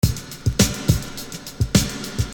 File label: Beat, Hip-Hop Street Beats